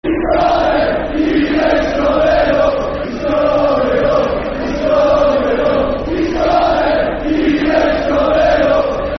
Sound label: Football Chant (Soccer Song), Newcastle United; He's Coming Home; Newcastle United Nufc